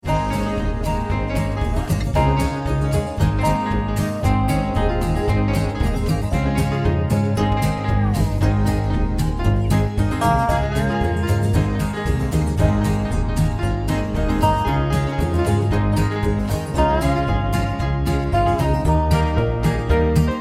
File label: Low Key